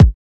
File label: subtle reverb kick drum single hit a sharp key; Duration 0:01; Samplerate 44100 Hz; Channels Mono